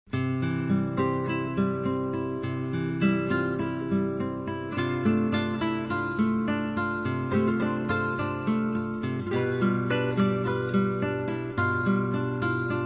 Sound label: Orchestra, Strings; Majesty Orchestra